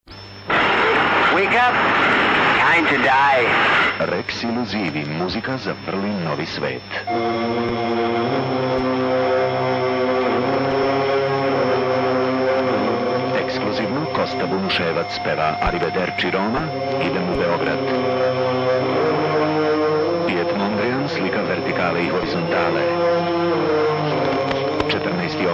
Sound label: Jingle